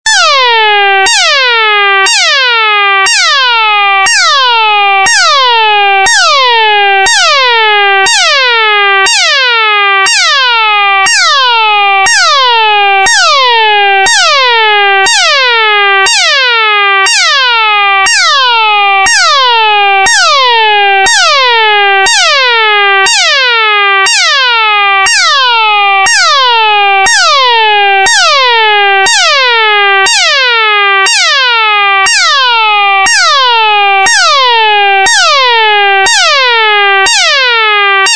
din alarm european

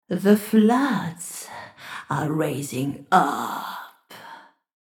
female horror voice siren

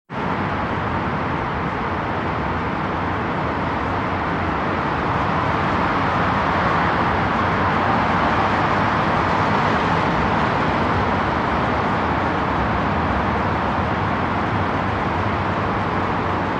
urban traffic

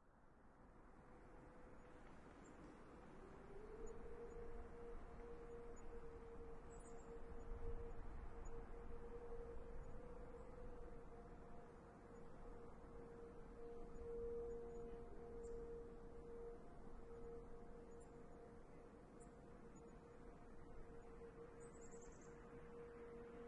tornado siren test

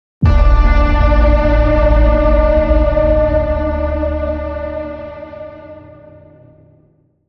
boom signal

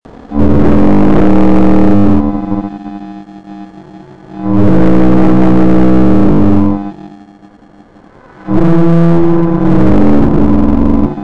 siren head blaring screech

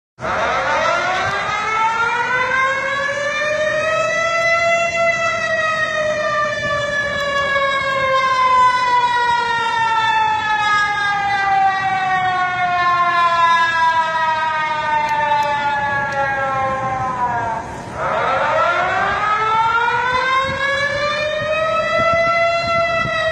malaysia eas alarm

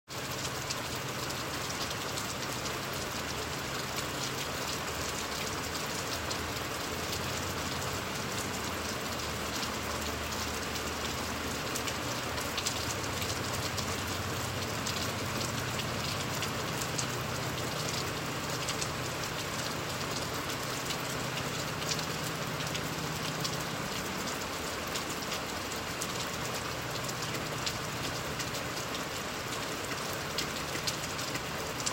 rain with police siren

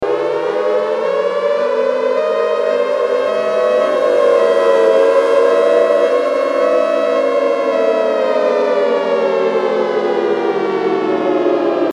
siren loop

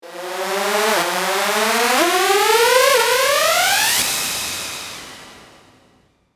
riser length siren sweep

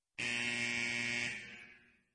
sirene horn

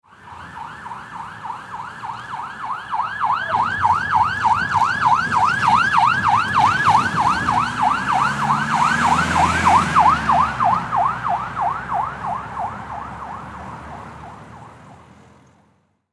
police car, sirenes

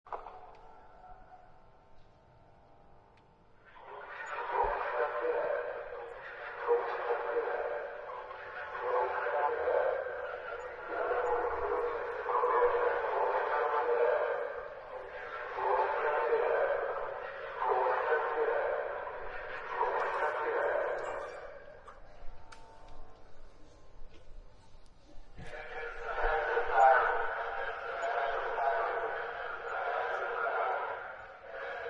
siren test prague

